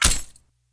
atk burin

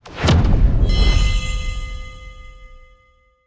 settlement unfinish

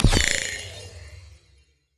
dark hole

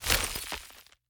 magic ice

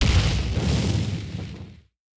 fireball

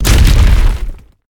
hammer

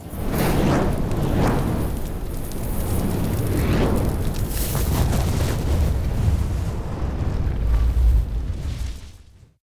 firestorm

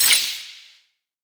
light mag

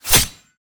sword close stab

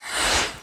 speed up

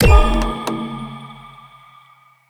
lantern

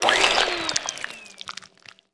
cleaner clean

